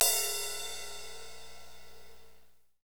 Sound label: cymbal, lite jazz, Roland; Northstar; Drumscapes Roland; AC Lite Jazz